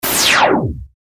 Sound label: laser